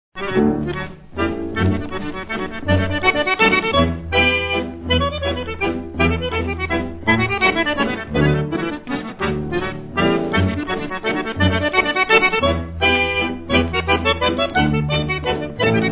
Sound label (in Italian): 78 giri COLUMBIA; ANNO 1941